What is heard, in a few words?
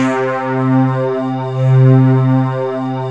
YAMAHA W-7; CHICAGO; Rubber Chicken Software Sample Bank